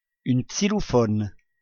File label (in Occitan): xilofòn; Lo lop-cervièr; jòcs